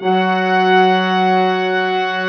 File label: BRASS2 F4, BRASS; AKAI S-Series CD-ROM Sound Library VOL-8